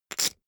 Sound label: poker chip stack big rattle short